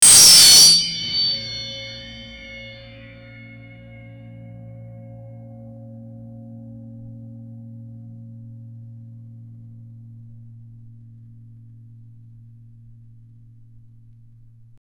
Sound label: devil metal hitting; instrument